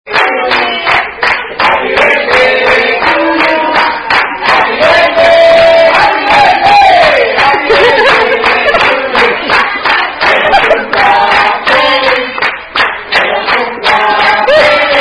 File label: Happy Birthday (Standard) With Spanish Translation; Sung By Chili's Wait Sta